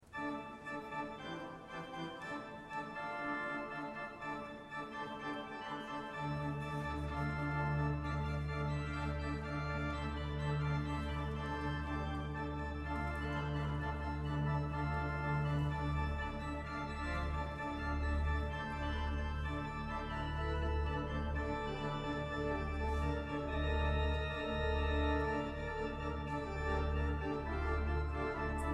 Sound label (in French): pièce tambourin